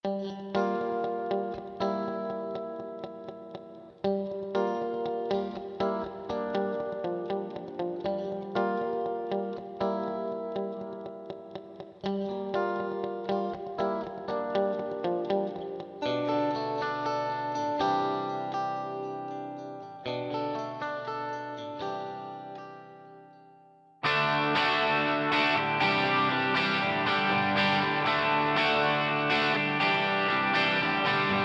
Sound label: Guitar Sony MU R201 Plate Vintage Digital